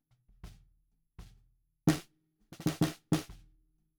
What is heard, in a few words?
SNR Bot